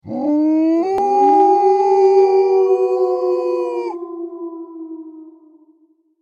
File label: Wild pack of wolves howling; wolf